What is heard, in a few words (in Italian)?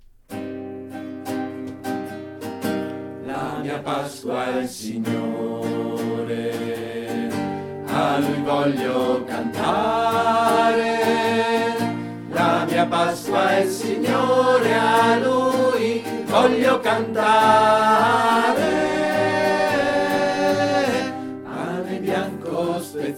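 La Mia Pasqua, tenori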